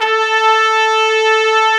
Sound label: TP A3; AKAI S-Series CD-ROM Sound Library VOL-2